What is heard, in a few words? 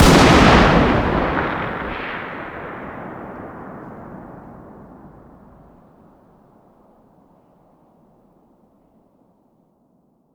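fire distant 338lm rifle exterior; gmod; arccw uc commons attachments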